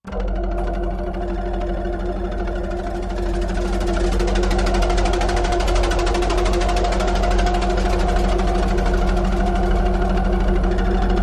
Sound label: Almost Fixed Machine, machines winches